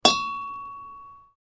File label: metal message tone